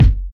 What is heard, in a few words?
boom kick single hit f sharp key